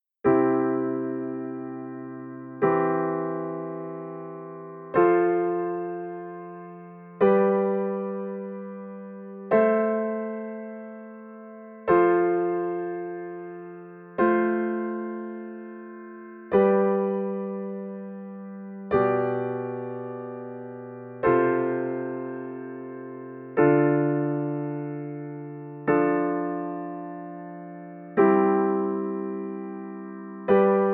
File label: bass inversions in C; Guided practice